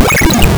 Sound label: YORP SCREAM; Keen 3